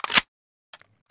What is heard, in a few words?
sako mag in; sako92; garrysmod